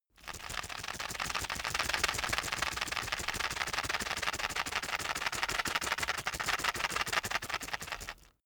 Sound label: plastic drug blister shake